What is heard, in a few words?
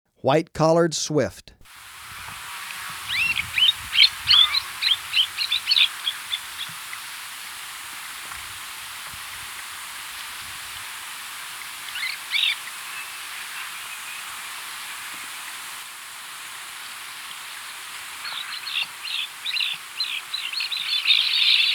White-collared Swift; S. America